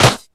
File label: Claps & Snares